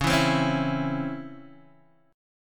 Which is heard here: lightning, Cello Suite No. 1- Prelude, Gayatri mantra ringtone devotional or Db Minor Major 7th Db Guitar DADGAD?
Db Minor Major 7th Db Guitar DADGAD